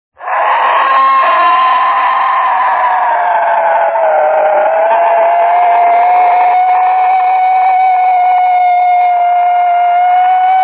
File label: scary wail